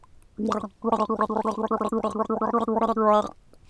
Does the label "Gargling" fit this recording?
Yes